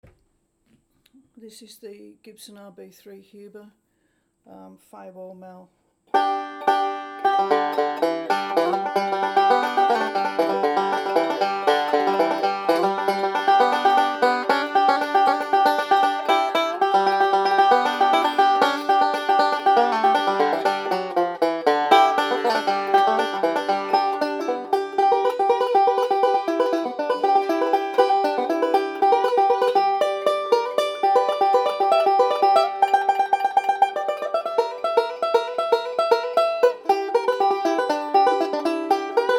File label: Gibson RB3, banjo; Huber Fireball Mail